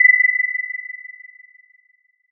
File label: Sonar 20000; MorgellansWaves